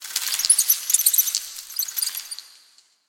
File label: rat panic, underground; ambient